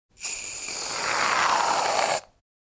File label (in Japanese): ohuro